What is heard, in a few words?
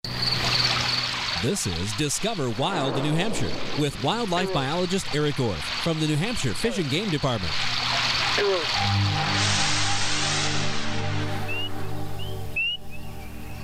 Peepers Frogs salamander; NH Fish and Game